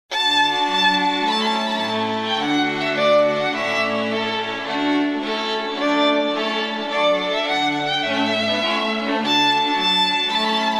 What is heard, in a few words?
Trumpet Tune, Strings quartet; Purcell